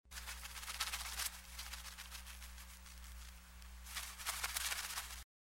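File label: moth in the corner of a room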